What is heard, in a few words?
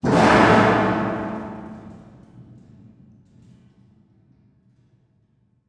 Crash Metal Can Hollow Creaking; crashes; AdobeCC